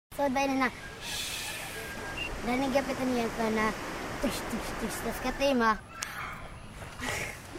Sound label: whoosh